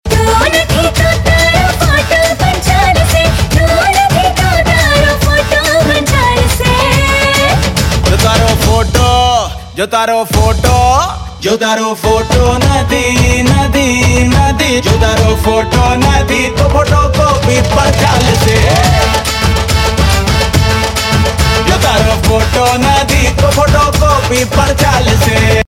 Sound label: Male And Female; Bollywood